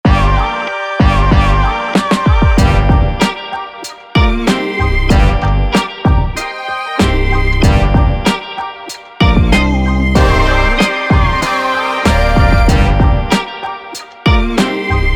Hip-Hop

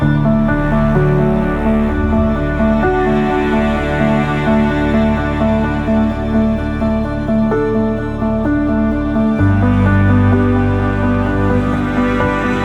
Orchestral

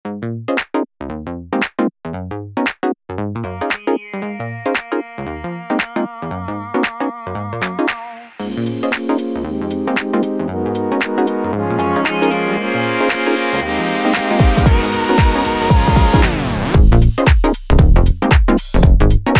Funk

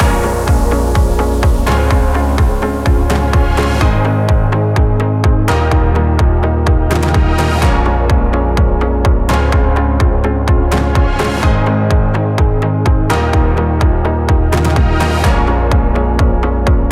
EDM

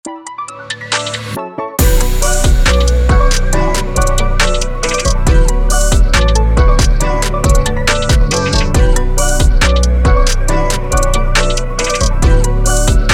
Trap

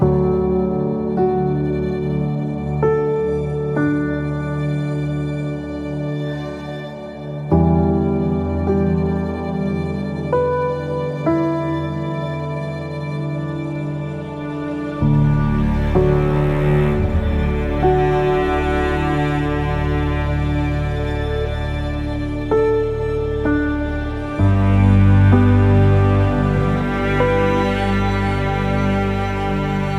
Orchestral

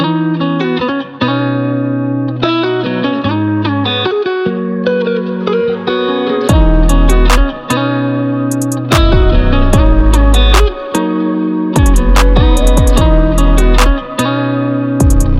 Mellow Trap

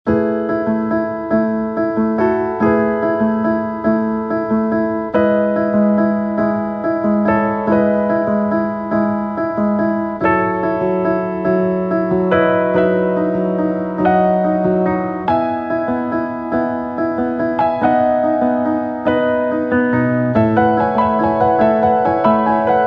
October Piano

